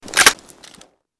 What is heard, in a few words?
charge back, weapons; f2000